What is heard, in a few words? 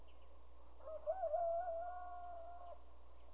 Rooster; Garden